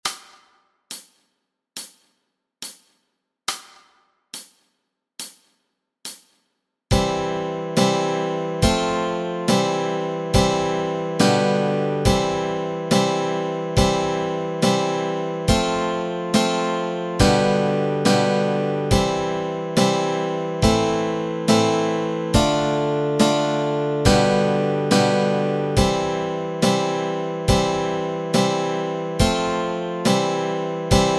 Chords Track, Easy Guitar and Ukulele

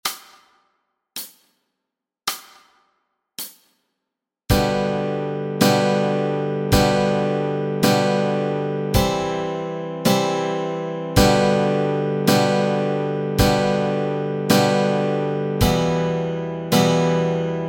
chords, Easy Guitar and Ukulele

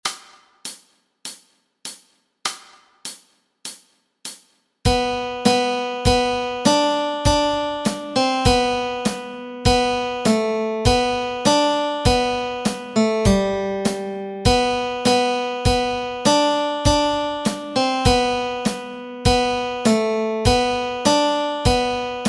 melody

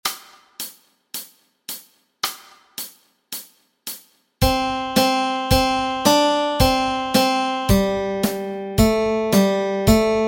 melody

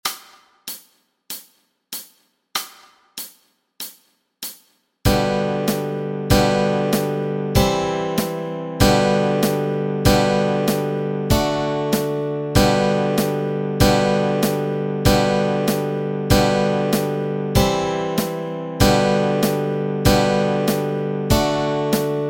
chords, Easy Guitar and Ukulele

